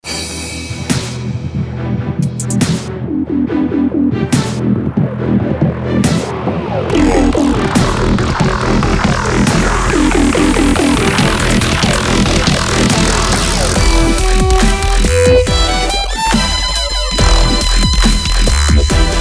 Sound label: sad violin dubstep